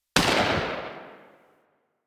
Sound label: Dex Sybaris Tail B; Warframe; Weapons; Tenno